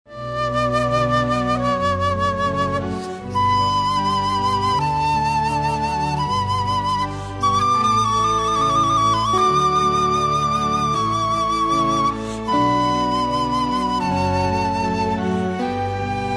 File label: canon pachelbel fl guit tecl